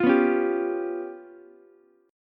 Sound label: C sharp 7th Flat 5th C sharp down Ukulele; C#7b5; strummed; JGuitar